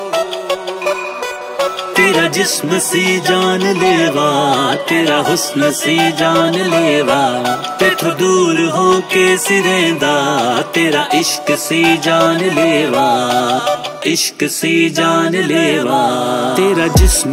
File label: Sad Punjabi Song Ringtone